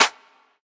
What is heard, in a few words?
short reverb clap single shot a sharp key